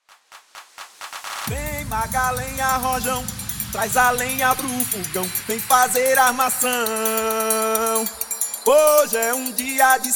Mashup Clap Starter Hype 130 BPM

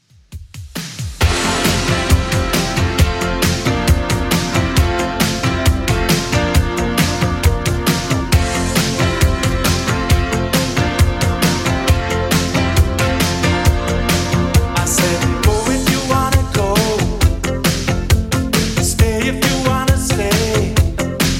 80s Club Edit 135bpm

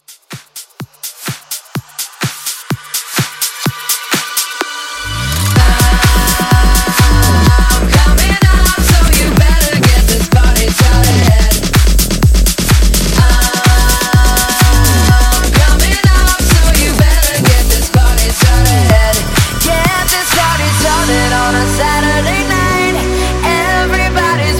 House Mashup 126

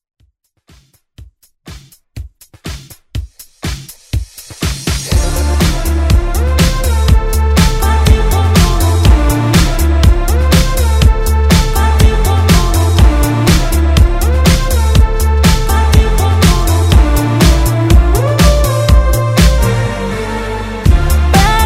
Pop Redrum

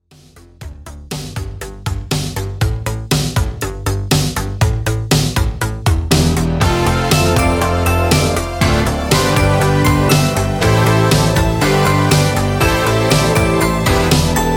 Intro, 120 bpm

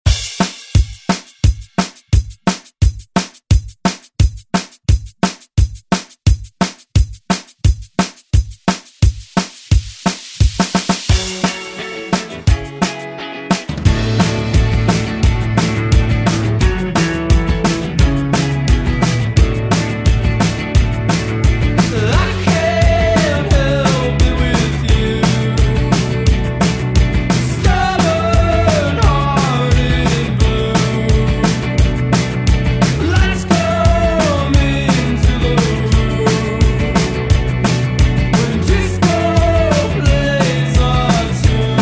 Disco Re Drum

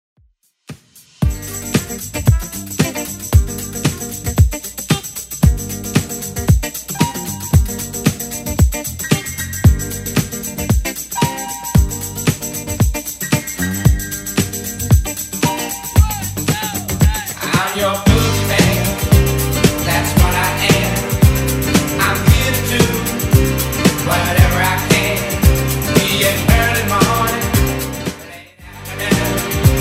70s Redrum 114 bpm

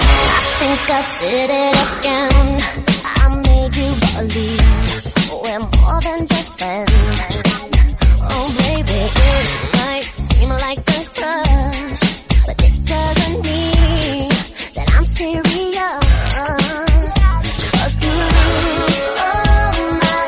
REDRUM Snippet 105bpm

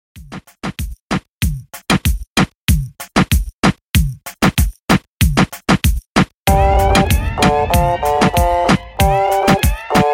Party Reton 95Bpm

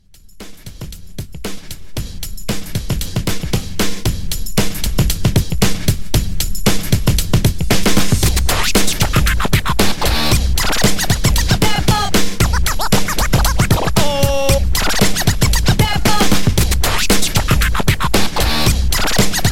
90s Old School Scratch; Re-Drum

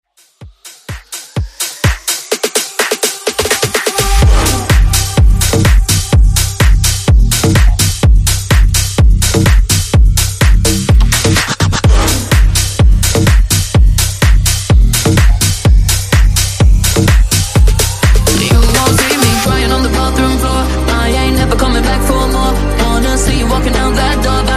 Top40 Bass House Smashup

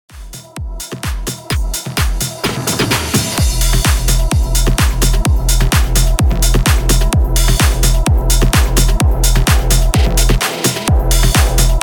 128 BPM

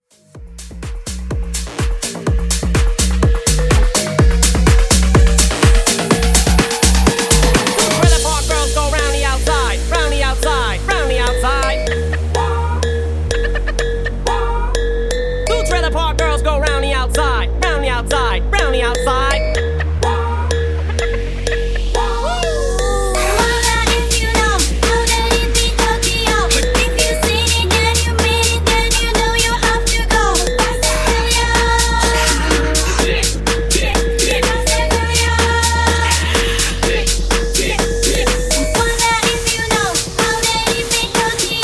Hook 125Bpm

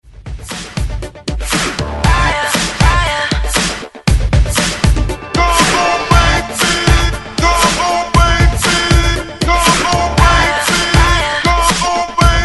Hype 118 Bpm; DJDOLLS

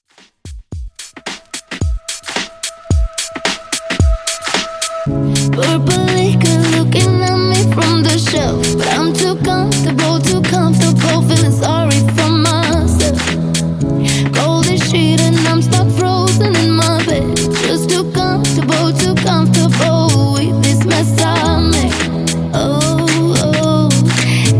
Top40 Club Redrum 110Bpm

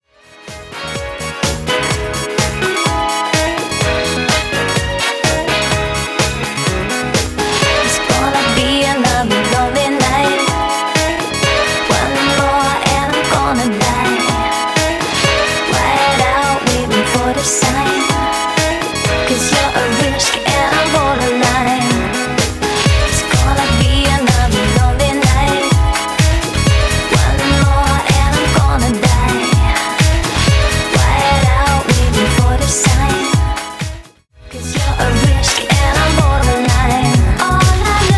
Vocal House 126bpm

